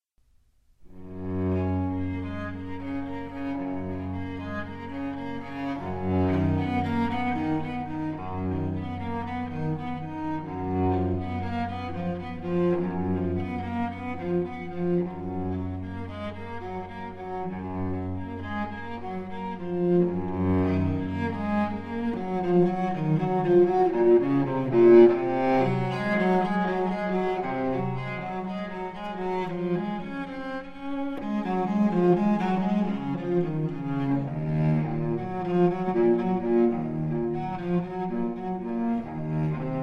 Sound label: bach cello suite no1